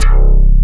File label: RV BASS